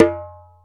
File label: Ashiko Go; Planet Earth; Digital Sound Factory Planet Earth